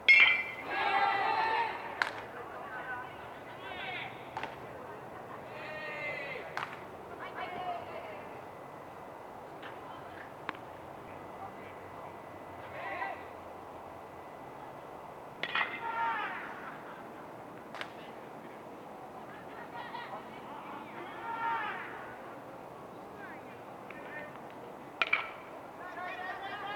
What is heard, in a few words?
high school ground